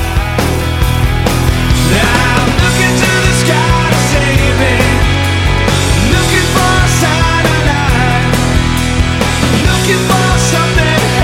music; jb temple

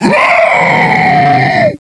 jump active; cstrike

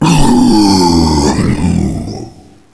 Dead, zombie; cstrike; Nemesis

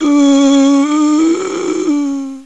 Zombie Idle; cstrike

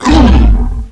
Monster Pain, zombie; cstrike

